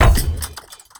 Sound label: Layer Shot, weapons; Bubble Gun Pistol; Kompressa Prime